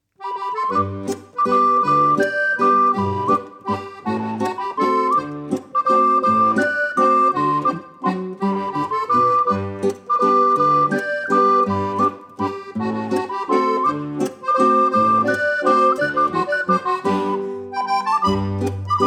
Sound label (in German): Okarina Walzer